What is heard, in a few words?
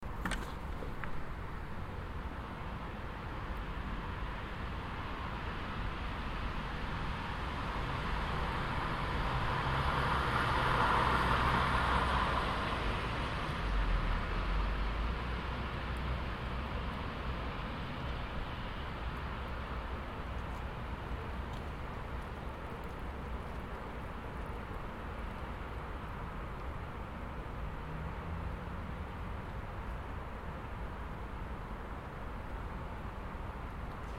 sounds of outside